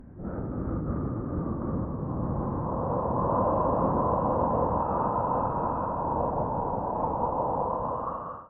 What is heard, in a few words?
Wind